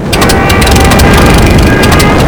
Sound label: henschel crash; WAR